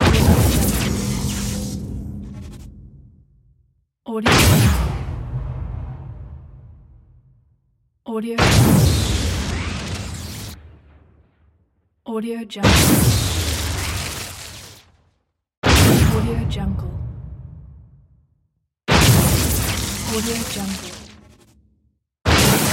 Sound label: space electric whoosh shot